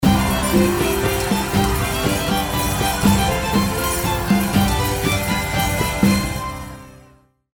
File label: Transition; goldenClover